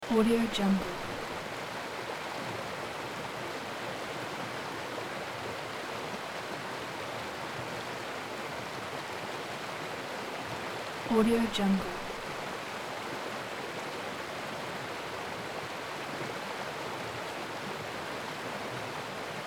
river mountain stream fast

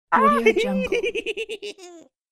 psycho male laugh; human sounds; خنده مرد روانی

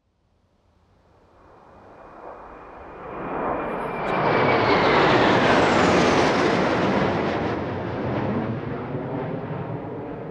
four military jet planes flying by

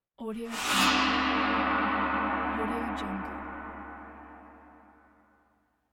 gong; human sounds

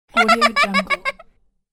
loud happy girl laugh; خنده بلند دختر بچه

